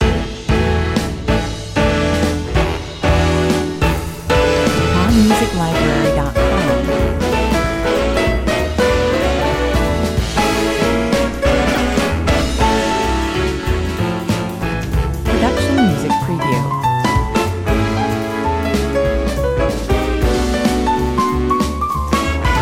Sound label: big band jam, no brass